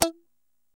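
guitar, funk guitar, Strats, clean electric guitar; PYB Strats; Clean E.Gtr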